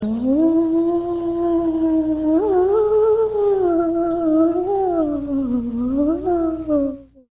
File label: ghostly moan